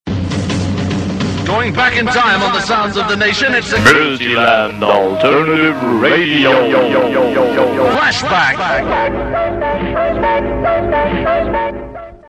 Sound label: Going Back In Time jingle; MAR